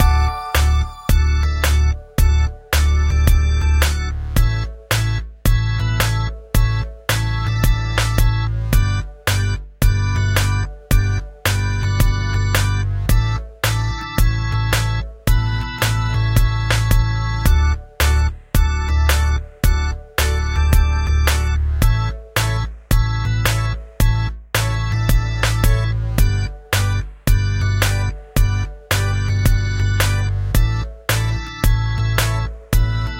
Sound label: Convenience Main Synth